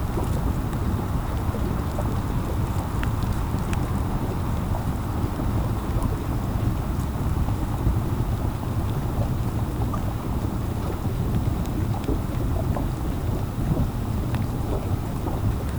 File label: Rain Sounds; Winter Springs